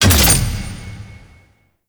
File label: challenge complete; Veilbreaker; Kahl